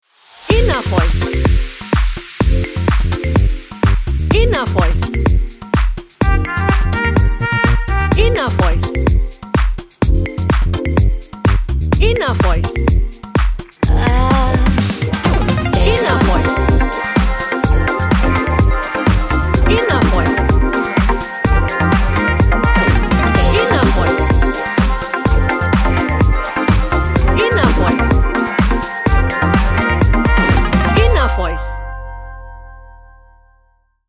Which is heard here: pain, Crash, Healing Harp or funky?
funky